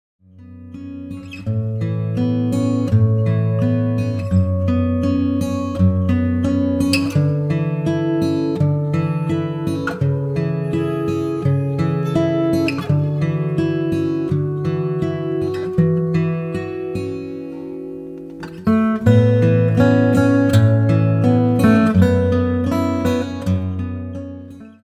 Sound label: stomp